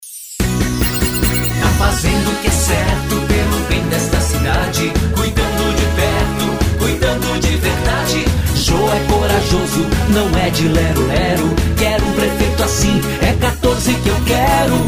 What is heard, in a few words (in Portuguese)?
JINGLE; PREFEITO